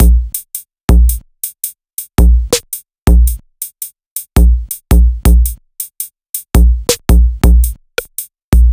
Distorsion Kit

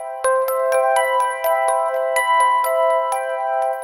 Treble EP

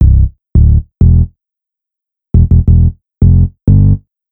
Distorted, rap

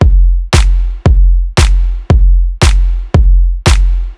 More Pop, electro pop

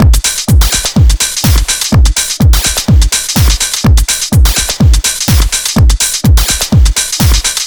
Zippel Beat D, dance

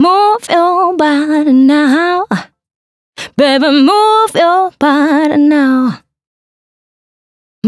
Fem Adlibs C; женский вокал; disco house

